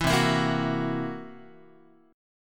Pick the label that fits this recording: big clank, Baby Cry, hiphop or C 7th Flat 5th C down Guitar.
C 7th Flat 5th C down Guitar